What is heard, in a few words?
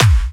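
snare drum bass drum, Dance Mega Drums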